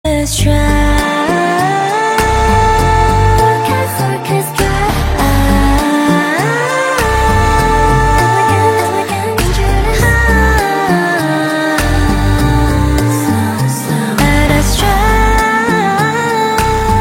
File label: orchestra